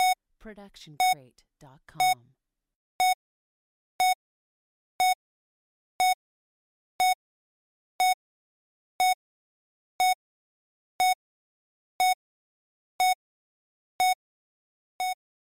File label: Bomb Timer Beeps